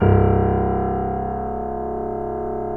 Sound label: PNO MF; Synclavier Sampler Library